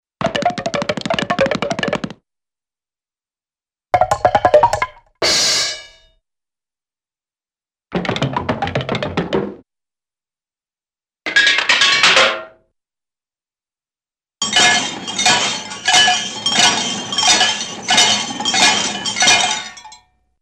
Funny Small Rapid Hollow Bonks - Down Stairs - Decenting, cartoon; Hollywood Edge; Cartoon Trax